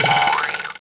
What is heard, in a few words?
beep; hofclient